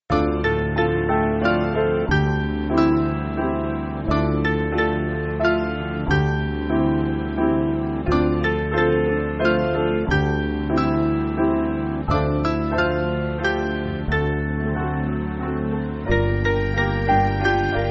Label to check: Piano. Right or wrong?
Right